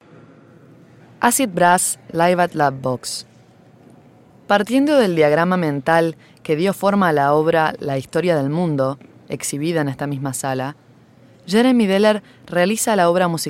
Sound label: acid brass; audioguia deller